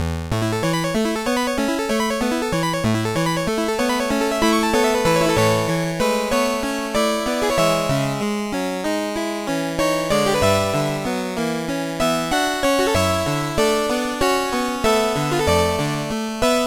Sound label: 8Bit City Day loop Start; DPPt